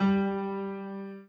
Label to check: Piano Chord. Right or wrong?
Right